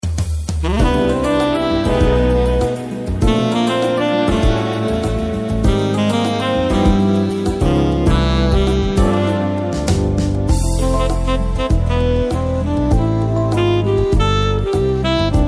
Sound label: Rhythm Blues